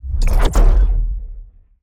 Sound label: ui line transition